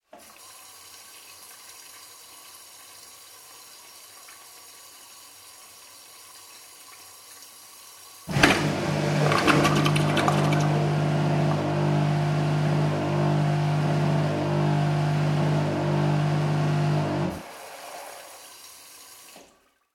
household toilet flush macerator; Macerator Toilet Flushing - Toilet Fills With Water Followed My Macerator Operating; Bathroom; McKinney Sound

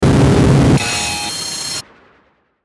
GLITCH STATIC, Glitch Static Crackle Noise Hiss; Production Element Imaging Element Accent Transition; Blastwave

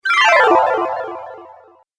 Falling Magic; Magic Sound Effects

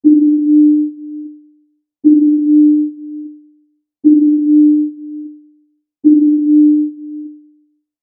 Sonar Submarine Ping Loop; Beep Sound Effects

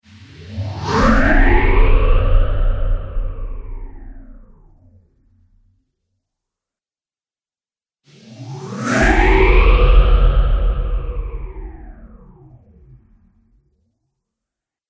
Trailer Hits Phaser Swoosh Collection; Trailer Sound Effects

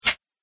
Whoosh Throwing Swoosh; Whoosh Sound Effects

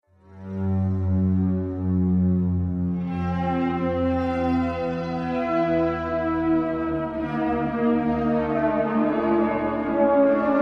The Haunted Orchestra; Ghost Sound Effects; Andrew Fleming

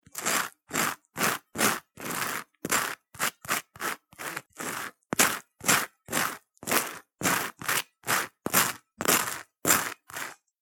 Buttering Toast; Scraping button on toast.; Food; Household

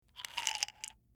Pill Bottle, Pour Out Pills; Pour Out Pills From Plastic Prescription Bottle; Bathroom; Household

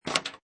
Pinball Sound Ball Roll And Passing Object; Video Games

